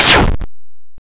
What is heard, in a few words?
Kill Enemy 8 Bit